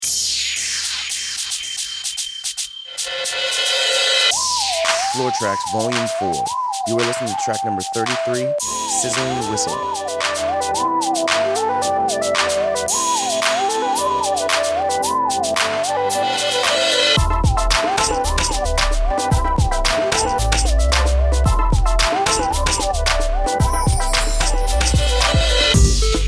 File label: Sizzling Whistle